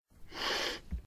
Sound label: sniff